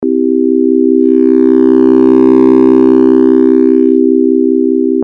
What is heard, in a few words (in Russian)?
C dur s peregruzom